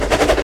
SFX - Trein Rijden

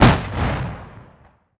Tank Schiet

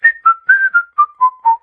Fluiten

